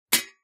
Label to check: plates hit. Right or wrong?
Right